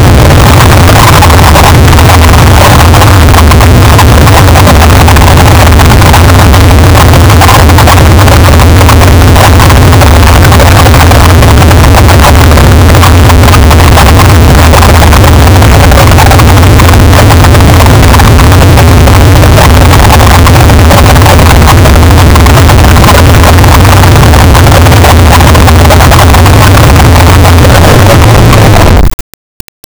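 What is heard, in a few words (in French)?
volcan en ébullition avec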